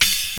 reggae open high hat c sharp key, Royality free open hat tuned to the C# note